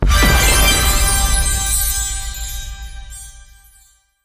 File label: scatter Win; netent; butterflystaxx